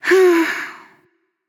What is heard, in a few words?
nv sigh; smite; awilix